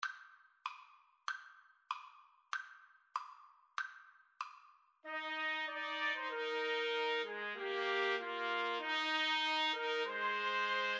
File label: Trumpet Trio